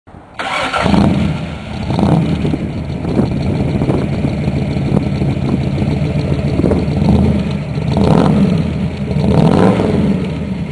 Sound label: mustang 302